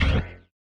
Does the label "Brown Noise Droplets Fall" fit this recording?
No